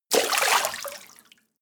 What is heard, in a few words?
splash